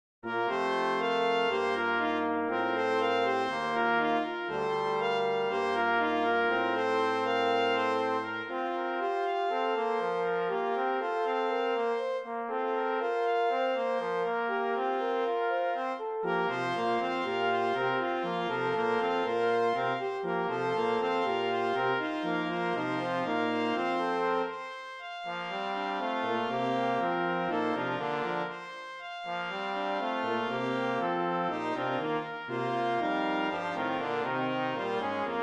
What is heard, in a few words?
Intrada For Brass